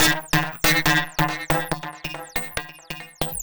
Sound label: TECHNO FX 140